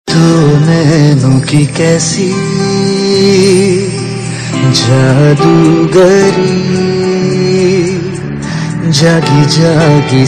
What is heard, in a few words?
Male; TV Serial Tone; Life OK